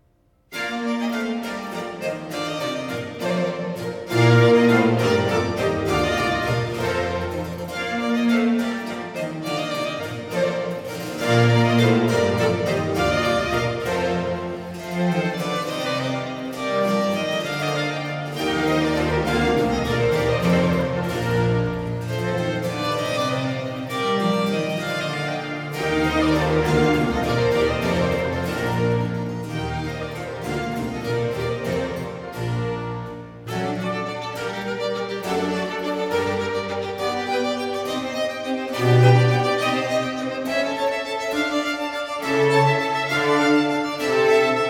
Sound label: Concerto 10 in C major 6. Minuetto, vivace; Arcangelo Corelli; Corelli - Complete Works; Klassika